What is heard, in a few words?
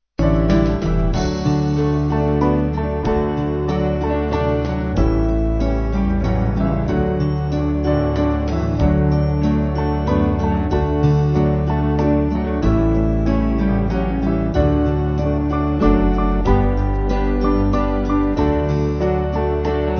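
Band, Music Backing; The Love Of God; Lehman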